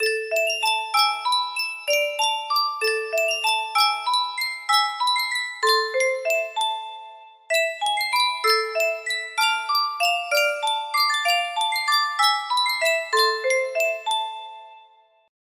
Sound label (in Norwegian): musikkboks